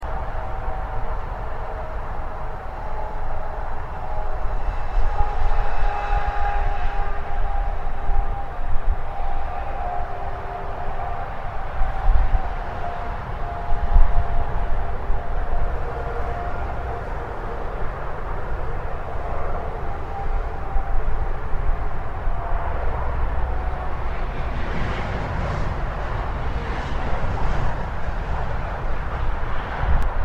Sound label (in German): Laermschutz Wiesenstrasse Sackgasse; Ostheim; Gewebegebiet Ostheim; ADAC A7